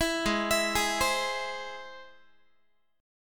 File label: G sharp Augmented, Guitar; arpeggiated; 19,16,19,18,16,x; 4A,4E,5A,5D,5G#,6C#